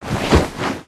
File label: backpack cloth; aronwk collection; ndaudio